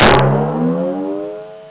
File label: BONG